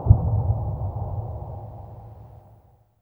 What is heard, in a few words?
THUNDER FUZZ, Impacts, Hits & Booms; Distored Reality